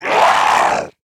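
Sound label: pain, zombie male; nmr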